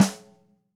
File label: Ludwig Supraphonic Snare F; 1965 Ludwig Supraphonic Snare One-Shots; Sonic Foundry (Sony Creative Software) - Vital Drums